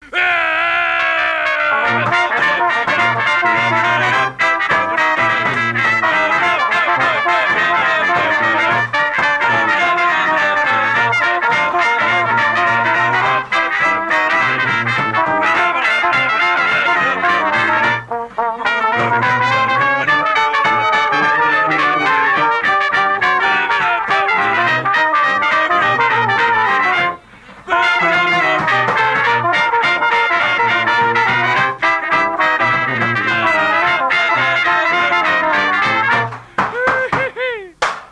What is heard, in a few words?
Marching Band